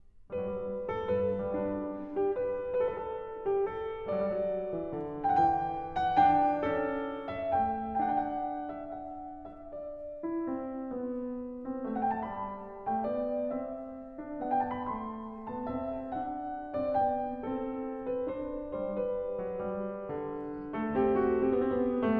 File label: Bach Goldberg Variations BWV988 Variation 7 - Un Poco Vivace